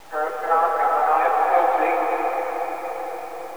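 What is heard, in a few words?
ambience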